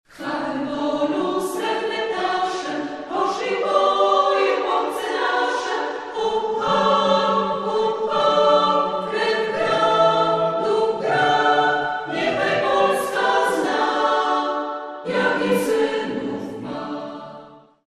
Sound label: acapp